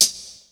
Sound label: HAT, Hip Hop; PlatinumPhattCD1; E-MU Producer Series Vol. 8 – Platinum Phatt